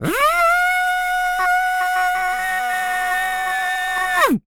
DRILL; Spectrasonics Vocal Planet; Jazz FX

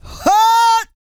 E YELL, MALE HUMAN; ILIO; Vocal Planet

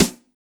BEAT SD

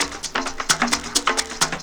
drum 130 perc, drumloops; Techno Trance Essentials

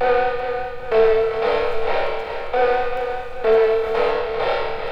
Fake loop 95BPM; radiosonics

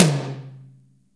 TOM, Drum Dry; East Collexion; Drum 1

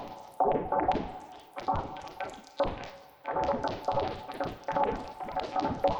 Tonal Re Draw, sparse soundscape

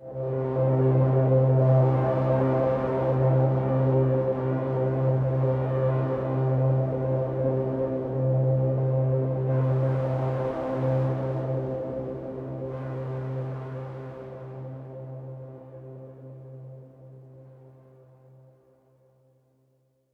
Pad C, Synth Prophet One Shots; Electronisounds

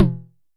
Electric Tom, Yamaha DTXpress

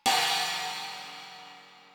crash, Millenium MPS-400

